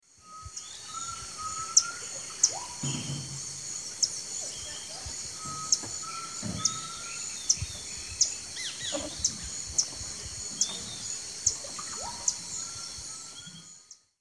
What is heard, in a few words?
Dendroica striata, call; S. America; Eastern Lowlands